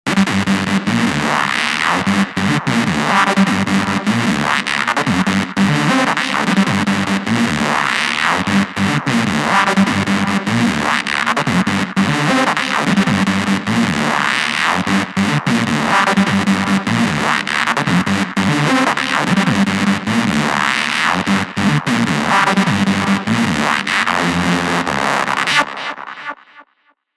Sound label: notch lead solo